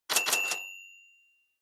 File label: Cash Register Drawer High